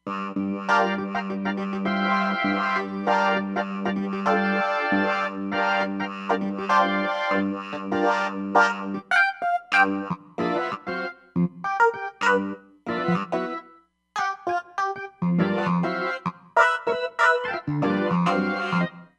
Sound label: Multi Funk, Roland Fantom XR